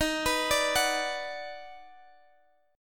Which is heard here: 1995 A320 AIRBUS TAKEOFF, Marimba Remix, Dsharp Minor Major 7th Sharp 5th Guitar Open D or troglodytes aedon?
Dsharp Minor Major 7th Sharp 5th Guitar Open D